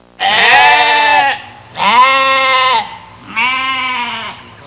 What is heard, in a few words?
Sheep bleating sound paused